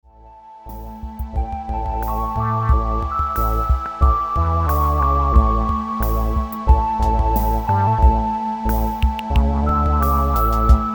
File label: ghost Gforce Moog